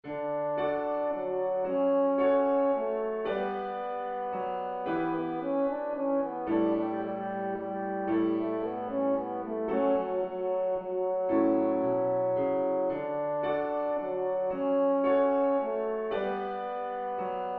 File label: waltz; puccini giacomo; musetta's waltz